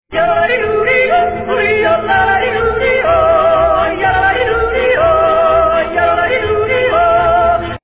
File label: yodel